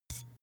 glass bottle handstroke soft

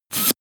air pressure release

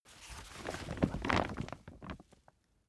camping chair rig up

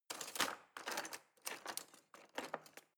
knife wood drawer handle rattle long

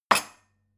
glass shelve wood

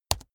keyboard laptop space press fast hard

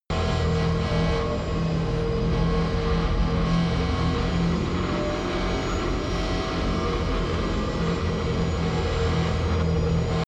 promo pad

